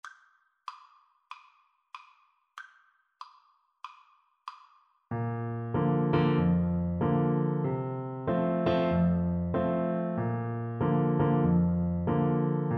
careless love, tuba

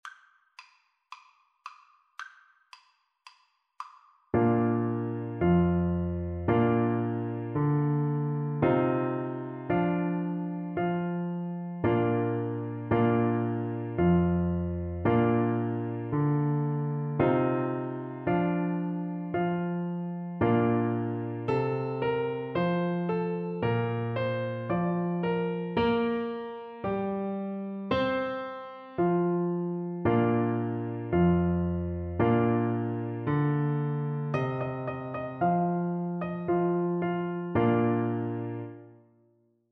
deck the halls BN, Bassoon

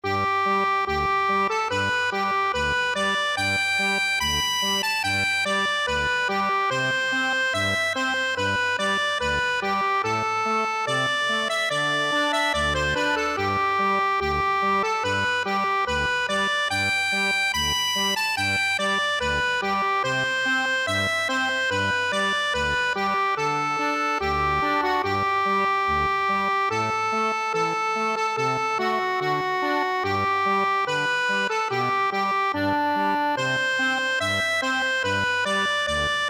scotland the brave, accordion

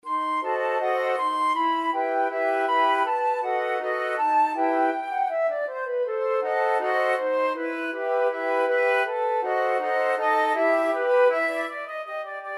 el choclo, flute choir

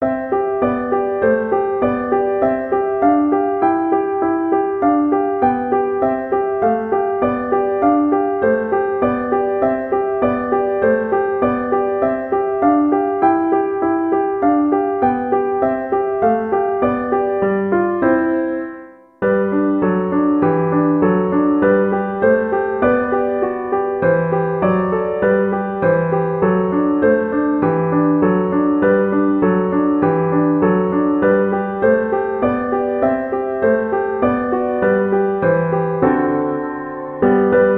humming song, piano

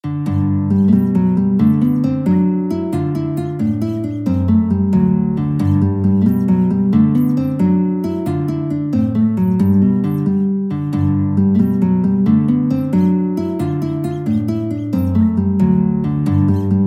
guitar

